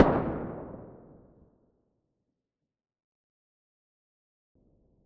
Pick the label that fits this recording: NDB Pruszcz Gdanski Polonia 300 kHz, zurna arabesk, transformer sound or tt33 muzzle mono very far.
tt33 muzzle mono very far